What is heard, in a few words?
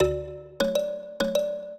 minuet, mbira; Mozart